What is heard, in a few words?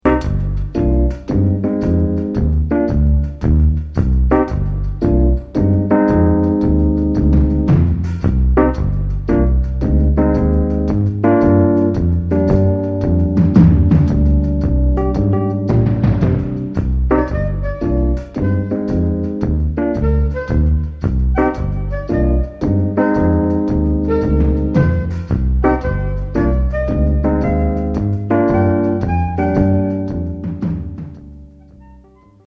bluesharp